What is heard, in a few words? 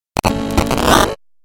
robotic glitch